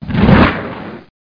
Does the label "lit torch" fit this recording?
Yes